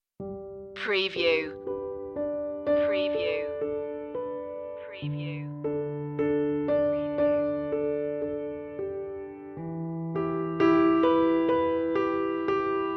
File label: piano lullabye